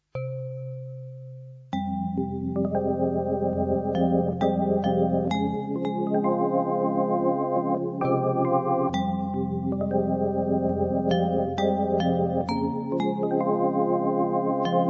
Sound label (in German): schlaege